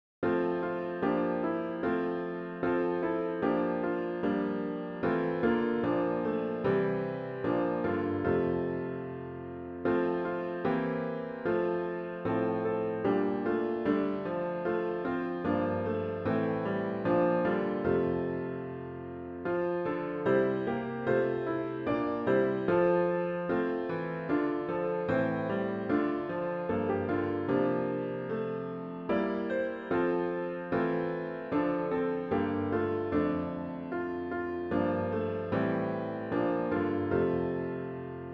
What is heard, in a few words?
piano only; Loaves Were Broken Words Were Spoken